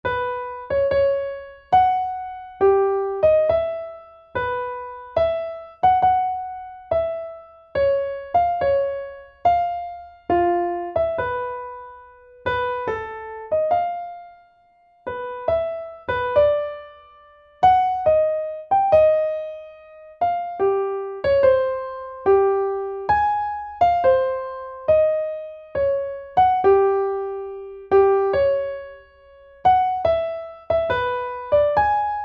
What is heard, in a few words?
Mazurka